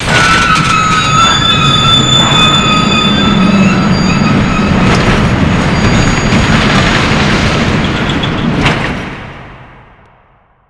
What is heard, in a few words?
rail ride; ambient; Half Life 2; Episode 2; outland